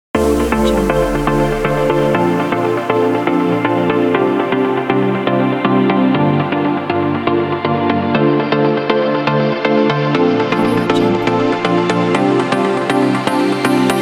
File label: chill